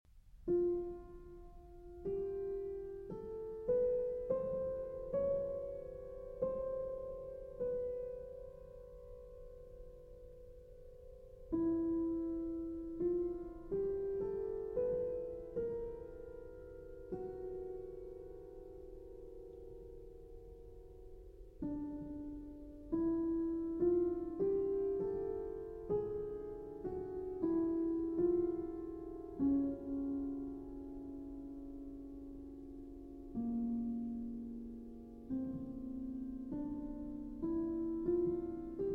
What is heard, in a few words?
Grand Piano